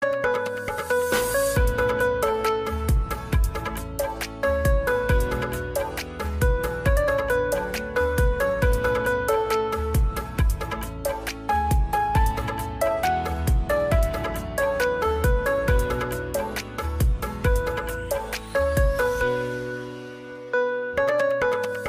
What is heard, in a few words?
instrumental